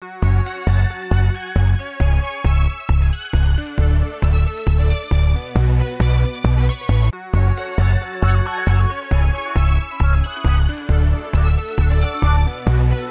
135 Full Mix, Trance; Zero-G Trance Inducer; 90 s Sample CDs

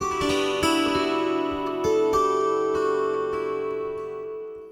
Kantele Strum; Sonic Foundry (Sony Creative Software); Friendly Fire

